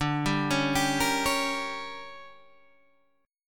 D Major 7th Suspended 4th Sharp 5th, Guitar Open G